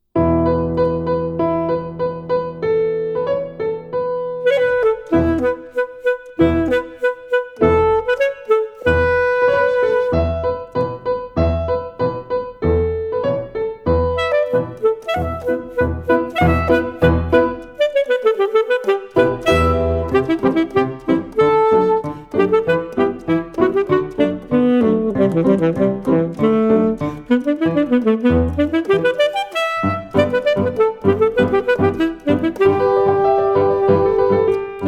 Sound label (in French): Sax, Shinobue, saxophone; Escapade musicale saxophone